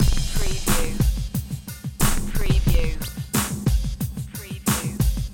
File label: Hip Hop Drum Loop 90 BPM Pneumatic Straight With Crash; lynne publishing